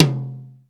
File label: HI EDGE DRUM